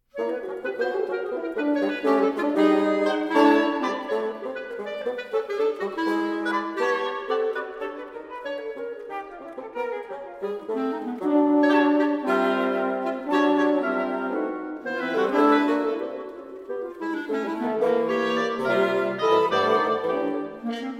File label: Woodwind Quintet Impetous, darting